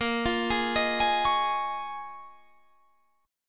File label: Bb Minor 11th Bb